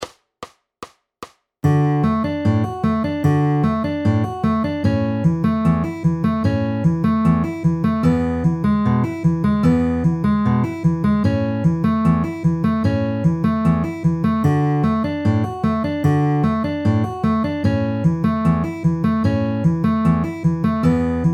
D A E A pinch middle ring with alternating bass at 150 bpm